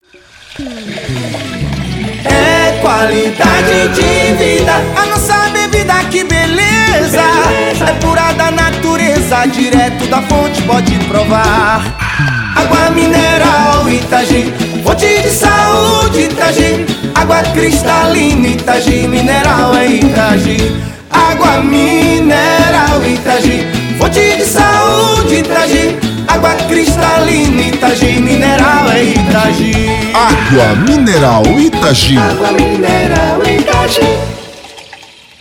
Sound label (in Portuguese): jingles agua mineral; itagi